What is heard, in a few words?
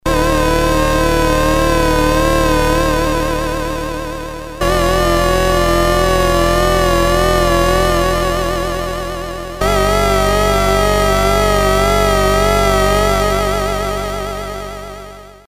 vcf as lfo, model53; subconscious communications; filtering